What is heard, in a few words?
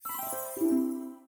popup down; ui; mofa; lineageos; vayu 19.1